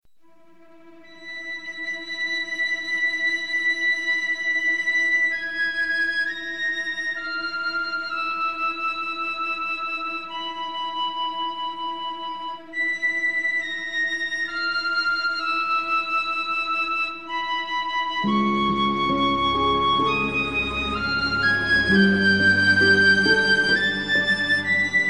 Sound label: picc; Piccolo Demo 2